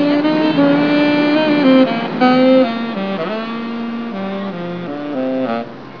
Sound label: sax, instruments